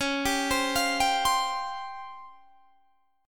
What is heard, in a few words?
C sharp Major 7th Flat 5th Guitar Standard; C#M7b5 arpeggiated; 21,22,22,22,20,20; JGuitar